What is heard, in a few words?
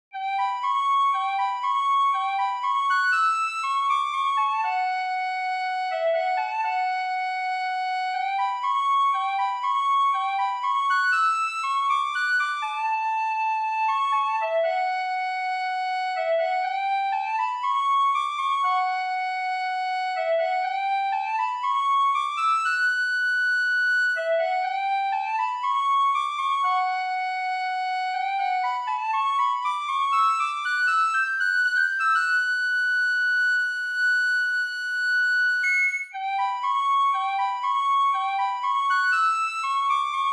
tin whistle only; LoZ